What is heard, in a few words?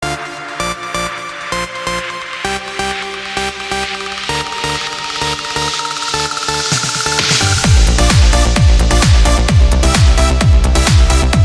Instrumental Version; MIUI12